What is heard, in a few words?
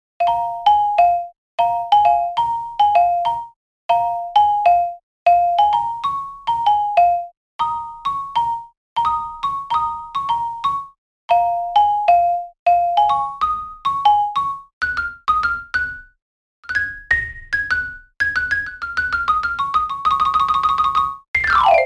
Marimba